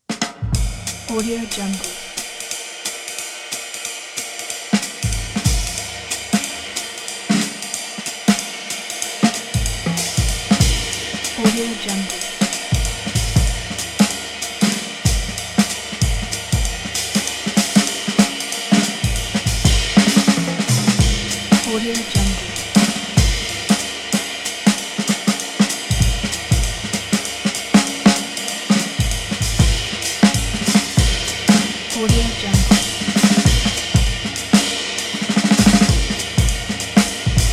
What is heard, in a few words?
tasty jazz drums city; smidsmid